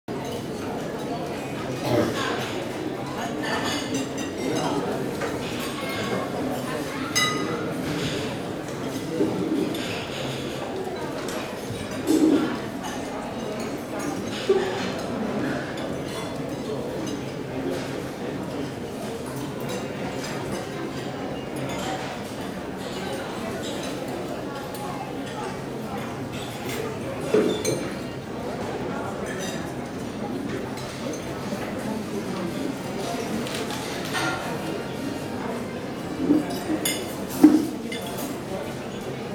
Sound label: cantina, surroundings; best service Blue Box